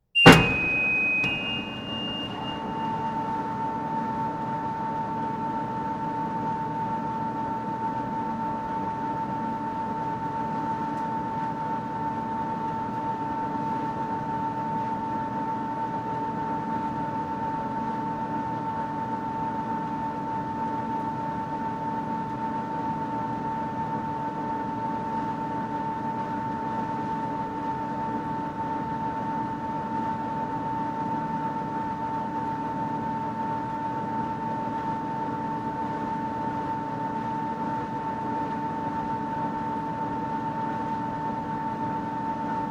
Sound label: Industrial Mechanical Potato Boxer Start Run Stop Long Electrical Motors Beeps; Conveyor Belt Sound Effects